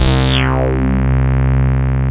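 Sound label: synth Morpheus; Sound & Vision - Gigapack II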